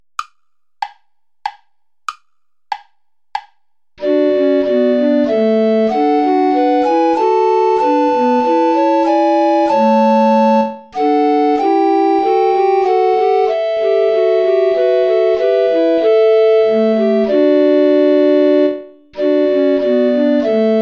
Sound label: play along without alto recorder